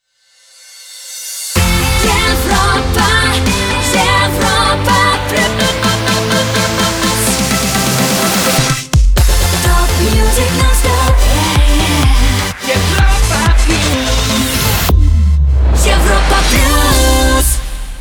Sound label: Jingle Main ID; Europa Plus